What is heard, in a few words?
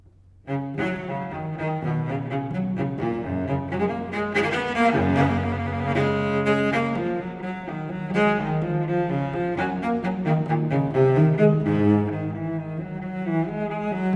Unaccompanied Cello Suite No. 1 in G Major Gigue